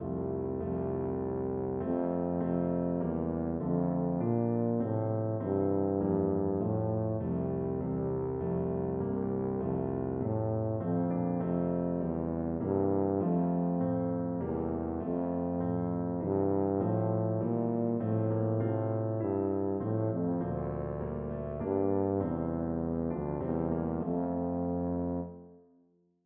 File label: wiltshire, tuba